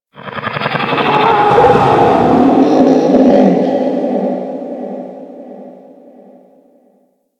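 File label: Settlement Distant Animal Ambience; Warframe; Grineer Settlement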